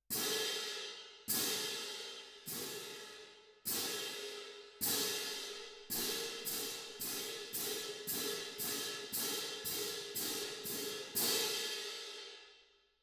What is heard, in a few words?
Perc